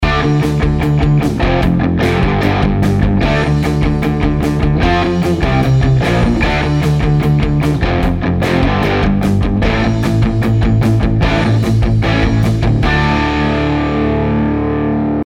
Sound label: Power Chord Rock Riff